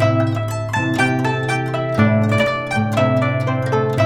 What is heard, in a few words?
GUCHIN 90 C; INIS - Opium